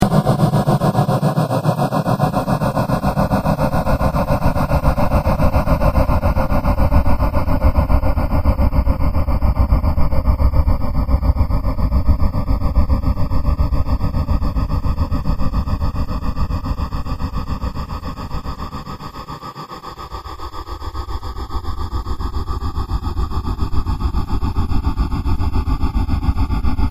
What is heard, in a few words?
trance